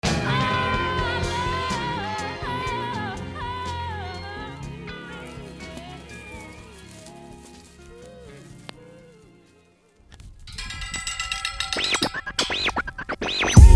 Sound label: New Jazz